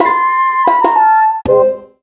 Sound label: A defeated; Amabilly; Xbox Series X/S